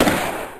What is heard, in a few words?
gun sound; hunt